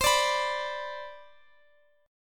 C Major 7th Suspended 2nd, C, down, Guitar, Open F; CM7sus2; strummed